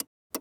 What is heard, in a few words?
Sign Shuffle; Cars; XBOX